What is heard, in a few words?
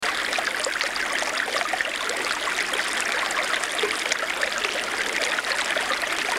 brook; tinnitusukshop